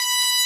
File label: Noise B7; ElectroBOOM